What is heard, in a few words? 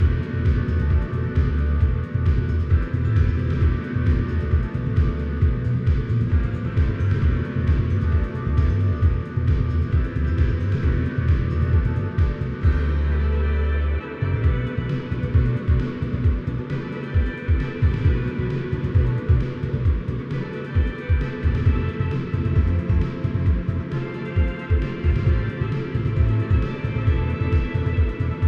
133 bpm; fashion police squad beta; Cruise 1 Shipside